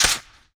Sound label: Flint Quick Mix, Period Combat; Sony Pictures Sound Effects Series